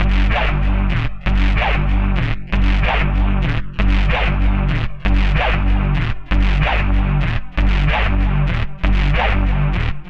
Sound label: Monsta Bass; Dr. Fink's Funk Factory